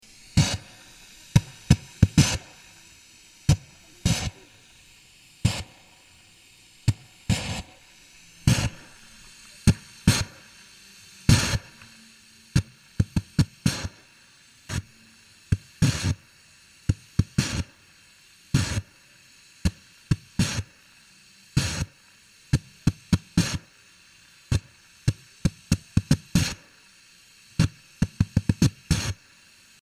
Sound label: sound scape ventil; klaus osterwald